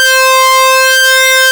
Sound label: NEW R SYNC, ARP Synths; Analog Odyssey; E-MU Formula 4000 Series Vol. 3 – Analog Odyssey